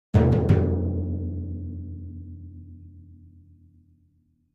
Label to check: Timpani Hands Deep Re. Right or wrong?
Right